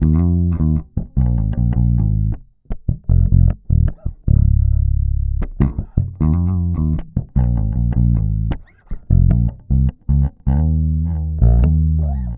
Bass loop 155 Fm, Electric Bass Loops Direct; Tyrian; Slate Digital BASSHOLE Sample Pack

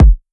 kick tight, Hip-Hop; Drum One Shots; SD CAICOS Hip-Hop Sample Pack; Slate

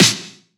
snare ringer; Drum One Shots; Slate; SD MIDNIGHT Sample Pack

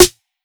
Snare High Up, 808 Snares; Slate; Vintage Drum Machines Volume 2 808 Edition

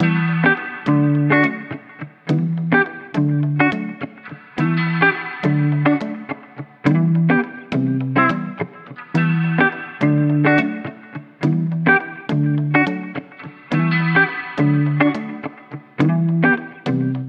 songstarter 105 Em, Pop; Slate; SD PASADENA Pop Sample Pack

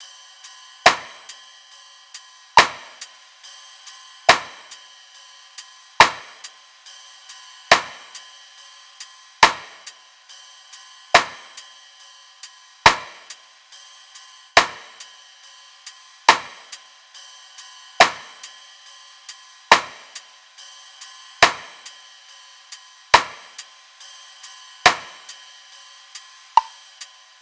tops 70, Drum Sample Pack, Loops, Top Loops; SD SEISMIC; Slate

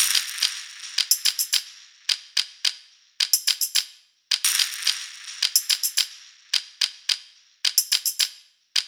perc loop 108, Pop, Drums; Loops; Slate; SD PASADENA Pop Sample Pack

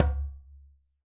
djembe Single Hit Left Hand Bass; Raw Perc One Shots; Slate; SD SEISMIC Drum Sample Pack

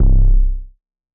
808 Bass Stubby C3; Slate 808 Deep Dive; SD Signature 808s

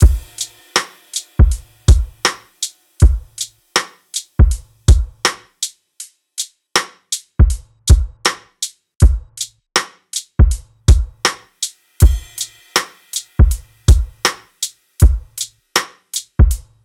drums 80, Full Drum Loops; Slate; SEISMIC Drum Sample Pack

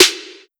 808 Snare; Slate; Vintage Drum Machines Volume 2 808 Edition

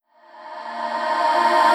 reverse, vocal sample

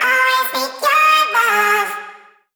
Vocal Sample Pack Phrases Ab; Slate Digital Omnivox Vocal Sample Pack

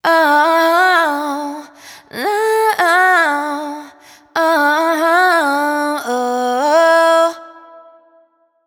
riff Uh Woah 110 D wet, vocal; Slate Digital Omnivox Vocal Sample Pack

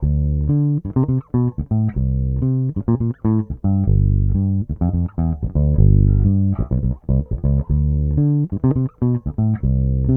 Bass loop 125 G, Electric Bass; Electric Bass Loops; Slate Digital BASSHOLE Sample Pack

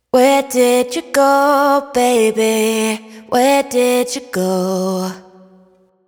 hook Where Did You Go 150 Bm wet, Vocal; Slate Digital Omnivox Vocal Sample Pack

